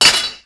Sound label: bang Break, tools Bangs